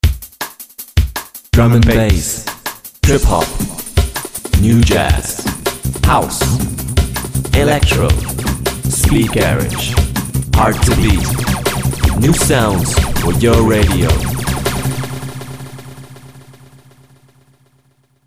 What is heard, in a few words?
Heart Beat Jingle